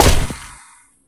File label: sidestabber; casualtiesunknown